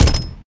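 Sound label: attack cannon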